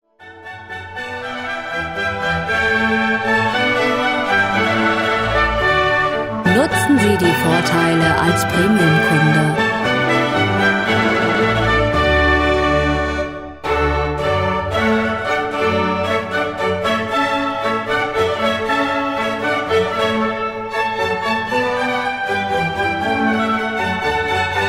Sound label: hornpipe